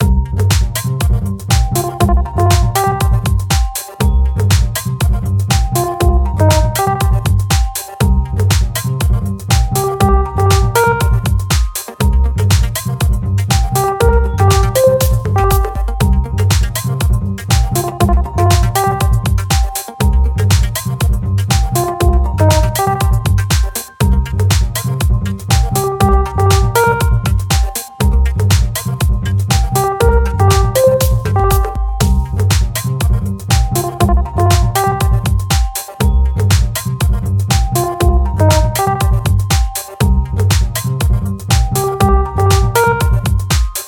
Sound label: Rain